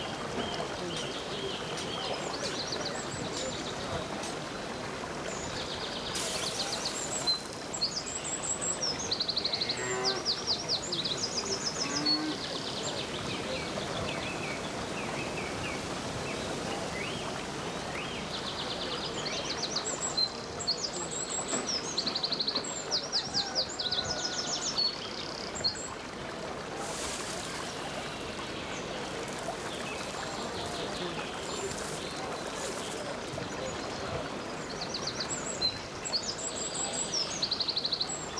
woods edge

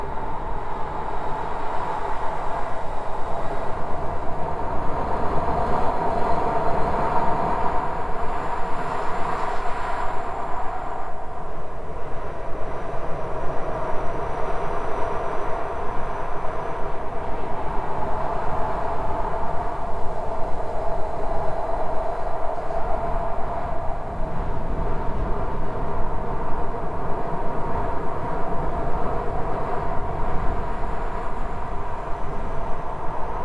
sandstorm dust devil; calendaria

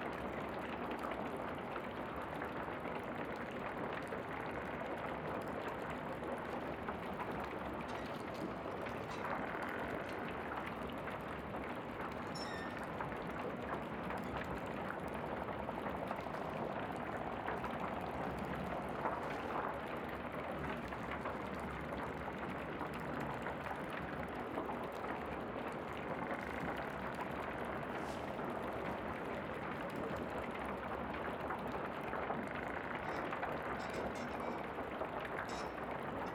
embers, ambience; pf2e; hells destiny

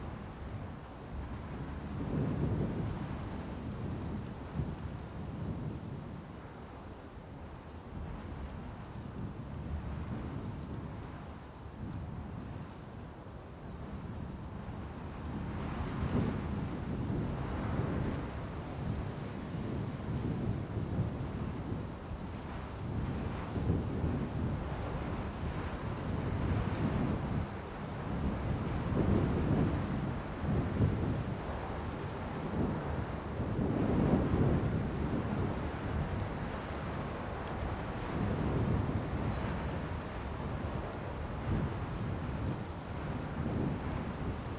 windy hills, ambience; pf2e; hells destiny

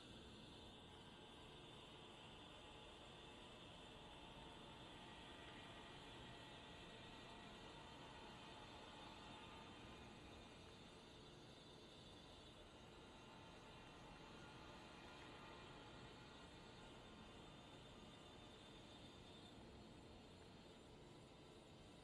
Background Hum; fa battlemaps

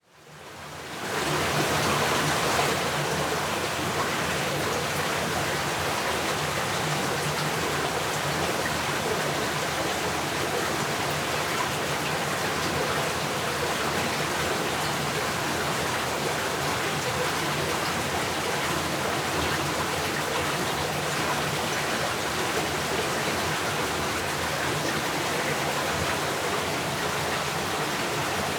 Cave River; fa battlemaps

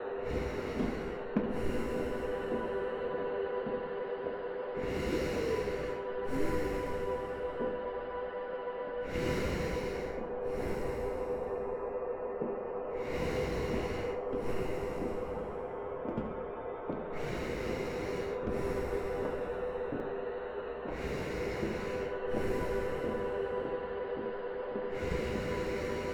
depressurized, ambience; sf2e; guilt of the grave world

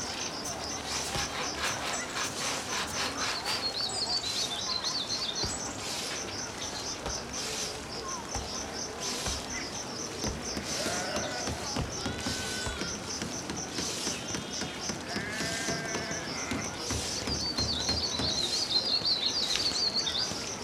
settlement, ambience; strix

